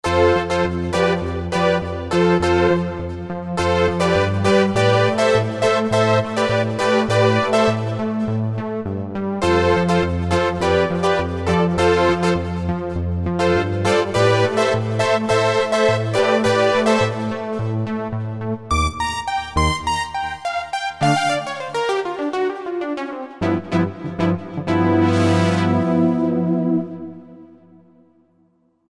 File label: Roland JV-2080 Poly Saws